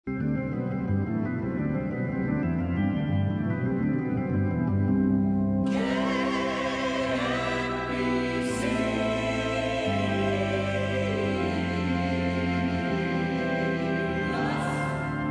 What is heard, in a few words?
Organ Gospel